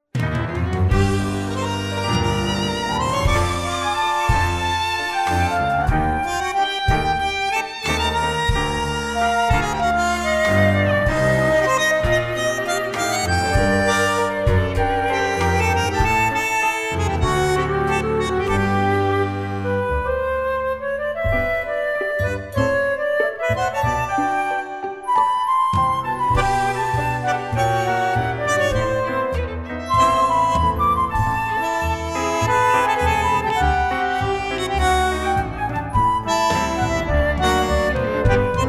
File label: G flute